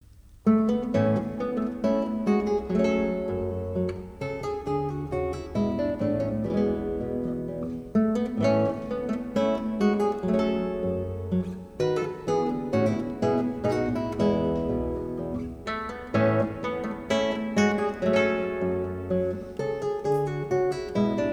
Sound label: Gavotte in G Major Arr for Guitar